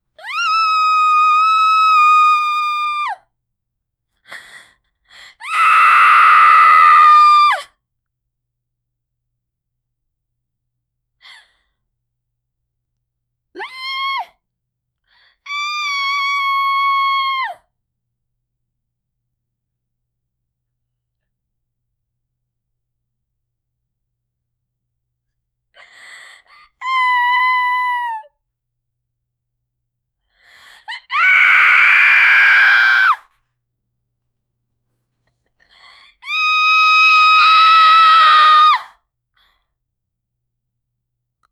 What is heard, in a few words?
the girl screams help and, fear